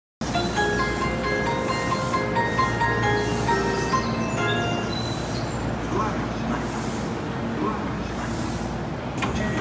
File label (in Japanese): 野田発車, train sounds